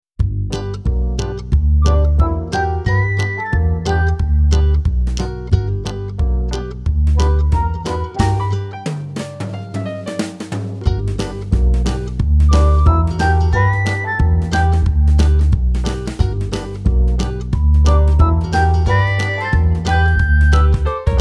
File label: Upbeat Playdate; Purple Planet Music